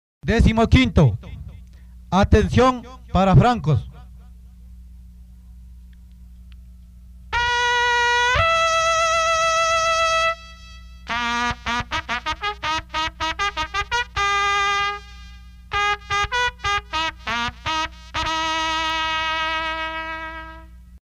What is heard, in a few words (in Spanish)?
atencion para francos, toques de trompeta de caballeria; himnos de caballeria